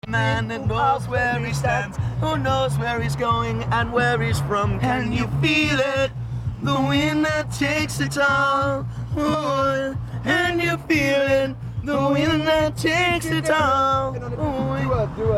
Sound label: Acapella